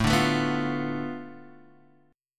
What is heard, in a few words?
B Minor 7th Flat 5th A